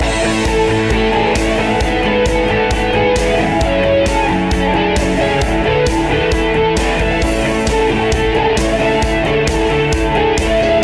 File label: business indie rock